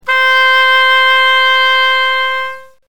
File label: oboe C5